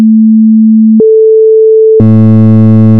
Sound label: sample tone